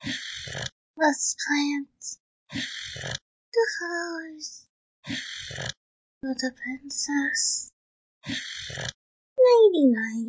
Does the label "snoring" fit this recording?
Yes